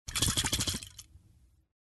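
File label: sounds of aerosol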